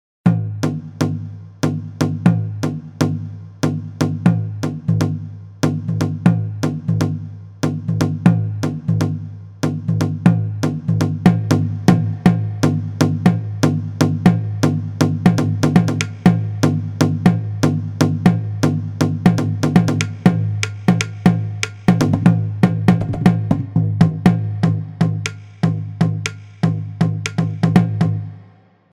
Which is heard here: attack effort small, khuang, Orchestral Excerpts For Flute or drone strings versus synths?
khuang